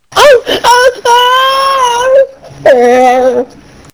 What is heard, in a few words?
ghost long moan